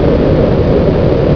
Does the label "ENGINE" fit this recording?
Yes